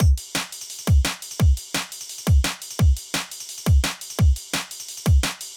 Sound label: DnB Tippy 172bpm